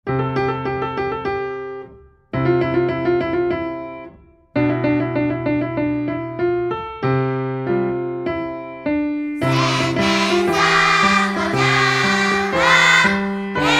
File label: song